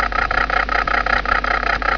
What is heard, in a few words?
MOTOR, wheels; Story Maker